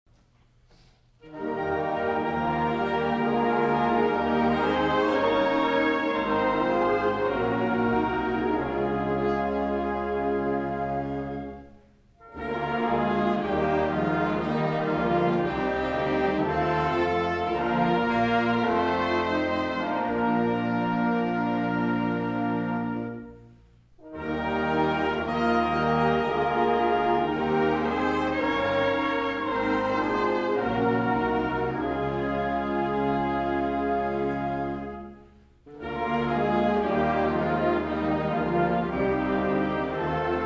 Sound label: Wind Ensemble; LAVC; Es Ist Das Heil Uns Kommen Her